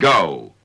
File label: VOX; ZERO-G; Datafile